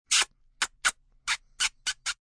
Kiss Multi Short, Kissing, lots of short smooches (human voice); Sound Effects:Human; VoiceBox